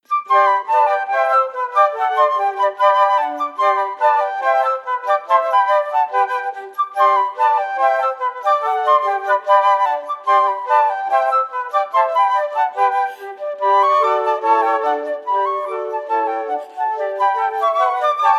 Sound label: Allegro Assai Quartet; Haydn